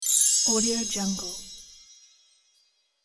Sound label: christmas wind chimes